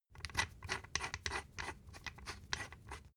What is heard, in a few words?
thread screw screw